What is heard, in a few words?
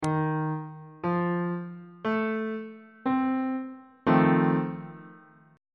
e sharp dominant 7th 3rd inversion on bass clef